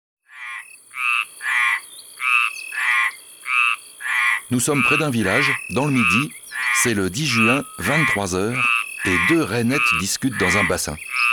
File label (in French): Rainette meridionale; ABC de la nature